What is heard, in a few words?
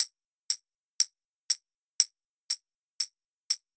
Tambourine